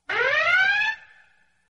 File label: plate hit